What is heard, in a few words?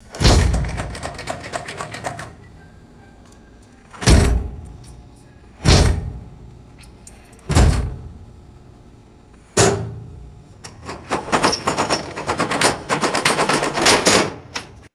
heavy metal vending machine shaking and rattling